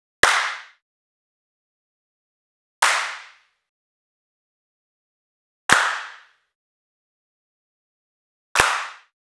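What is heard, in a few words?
Hand Claps Small Amb, left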